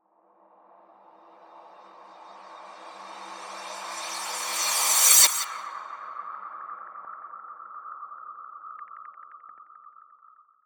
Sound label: Riser